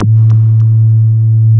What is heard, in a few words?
WALL MOOG, Synthy Tonal; Distored Reality